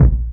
kick, classic hip hop; STYLE KITS; Classic Hip Hop Mega Kit

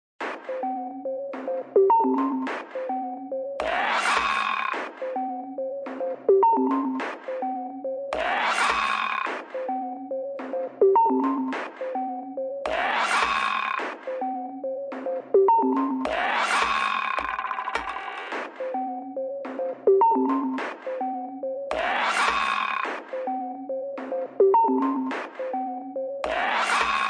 106 bleepy bleeps; REMIXABLE COLLECTIONS; bleepy mahem 106bpm

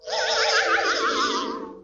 vintage vibe whistle, Cartoon Sound Effects; UNUSUAL KITS and FX

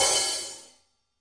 real HiHat; REAL LIVE KITS; A Real Freakin Drum Kit

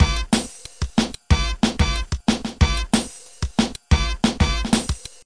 pressbronx, drum loops and breaks; 181 bpm plus

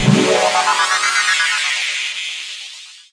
sweep up chord; Pads

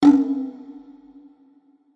Processed Ethn Perc, Doru Malaia Percussion

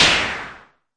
Arturia MiniBrute noiz

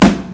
OlSchool SN, dirty hip hop; Dirty Hip Hop Kit; style kits

